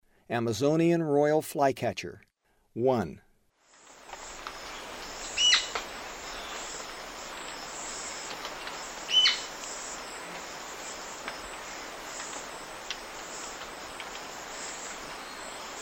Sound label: Amazonian Royal-Flycatcher; S. America; Eastern Lowlands